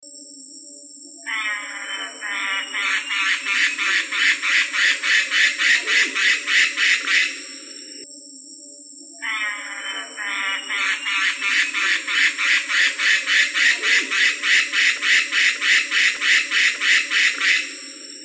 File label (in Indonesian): suara sempur hujan sungai